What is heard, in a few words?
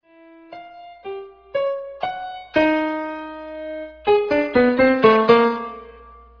melody